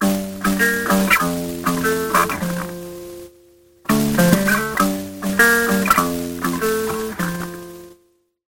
Stereo Flanger, Threadbare Vinyl Record, Guitar Wet; Sonicircuit; Syntheway